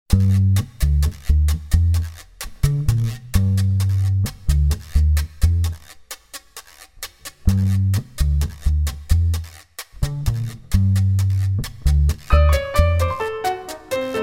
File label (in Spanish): Piano y violin